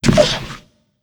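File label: Npc peaky turtle damage; Fly For Insanity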